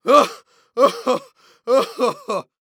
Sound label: Human Male Laugh Catching Breath Laugh Short